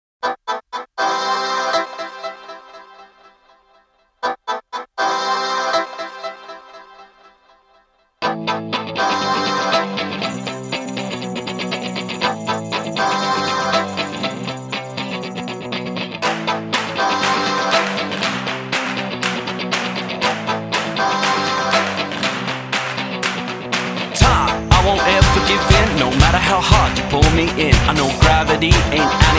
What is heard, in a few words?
Deep metal; vanquish